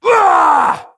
Horseman Attack; svencoop; keenhalloween; horsemen

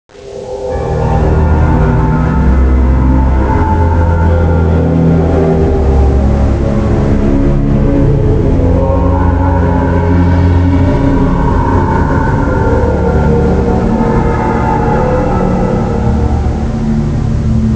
stinger song

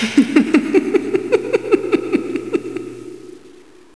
giggle

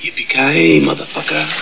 yippee

